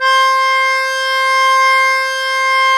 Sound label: Accordians, Euro. Accord; Club-50 - Foundations Roland